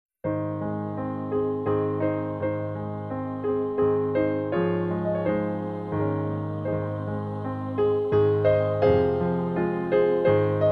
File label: waltz Ab